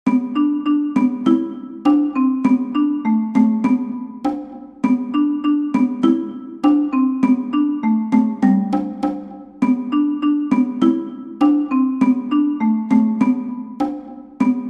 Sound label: Cajon